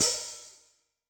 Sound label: HiHat, Yamaha DTXpress-II